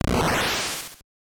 Airship Movement; super mario bros. 3 1988 nes

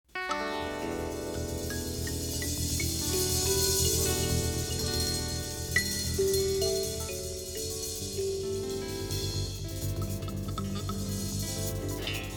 Kalimba Song

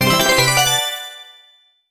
Got Item; paper mario 2 the thousand year door

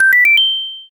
Signal Ring; super mario rpg 1996 snes

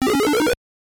Power Up (Block); super mario bros. 3 1988 nes

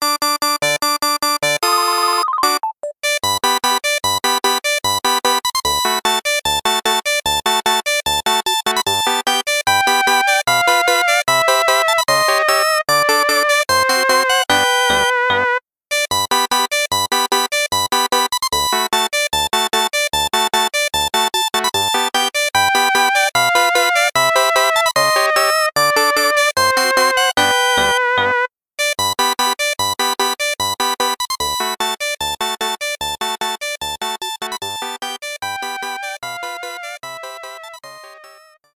Super Mario World Ringtune; paper mario 2 the thousand year door; Get E-Mail From Peach

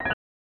Star Jingle; mario paint game rip

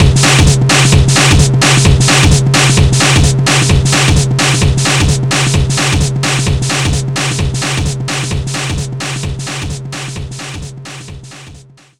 Invincibility, Ethno Circus II; sonic rush original sound version

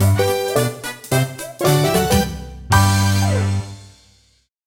Course Clear; super mario 3d land

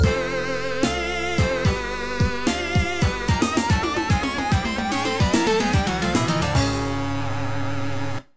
Game Over; sonic the hedgehog 3 angel island revisited pc android gamerip

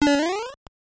Super Jump; super mario bros. 3 1988 nes

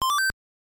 Level Clear (Map); super mario bros. 3 1988 nes

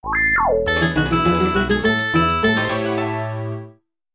Battle Victory; paper mario mario story 2000 nintendo 64

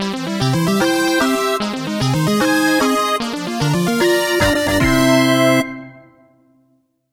Course Clear (Retro Ver.); super mario 3d land

